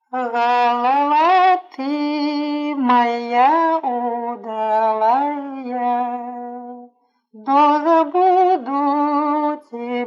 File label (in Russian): лир. городская; Голова ты моя удалая